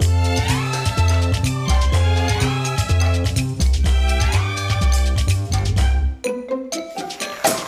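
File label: food world music paradise fight no guitar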